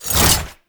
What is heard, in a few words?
Whoosh, weapons; Gunsen Prime